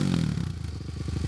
Decel, Engine

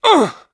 HIT; Star Wars Knights Of The Old Republic I; XBOX

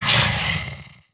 hiss, creatures; Star Wars Obi-Wan; XBOX

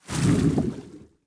under swish

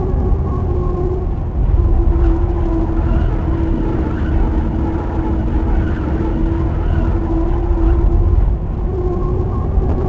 Ambient

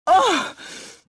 pain; mothma

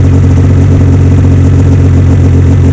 idle, sled; WhiteOut

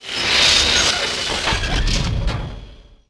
destr droid unfold, droids robots

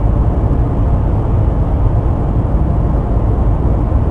piston move lp, movers; movers platforms

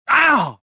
pain25

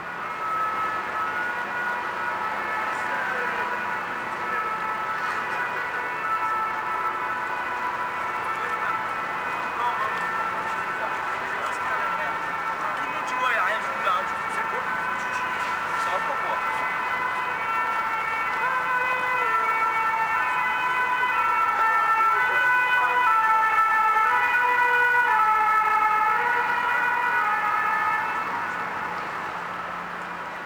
Paris River, Ambience; Project Gotham Racing 2

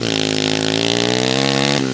power, Engine

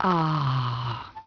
F happy; People

